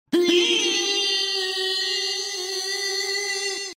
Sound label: reeeeee; cave